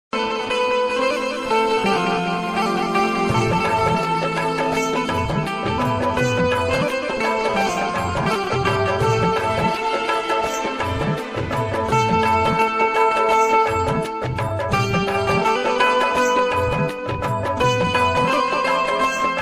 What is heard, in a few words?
best banjo ringtone music